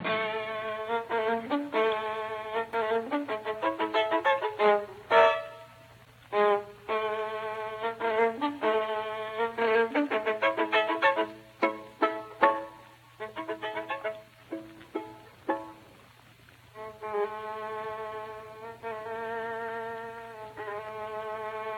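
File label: Violin Concerto, Finale, Violin, LIVE RECORDINGS; TCHAIKOVSKY; Cembal d'amour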